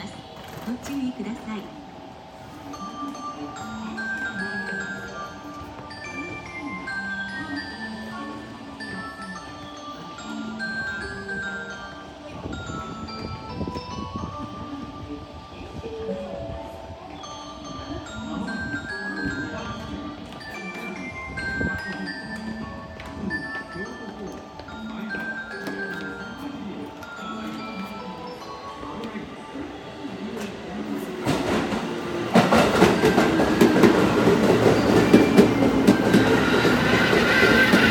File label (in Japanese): JR山陽東海道線島本北接近, train sounds